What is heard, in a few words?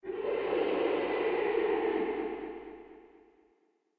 entrance, undeadtower